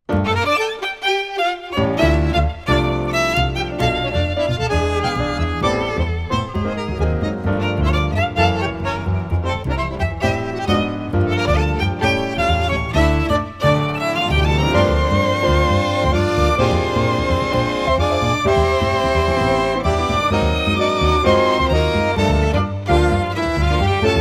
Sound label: Candombe; LG